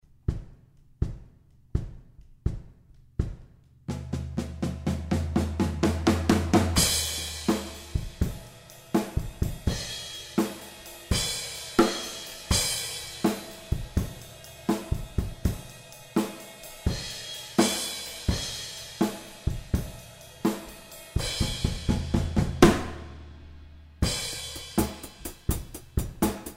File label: drums; hd drums